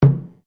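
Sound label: Drum Taiko Large forte, Japanese Taiko Drum; Miscellaneous Musical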